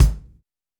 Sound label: kick, house